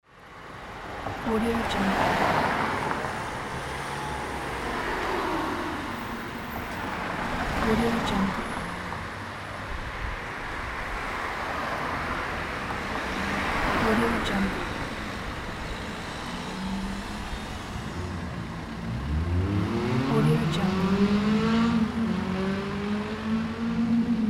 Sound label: city traffic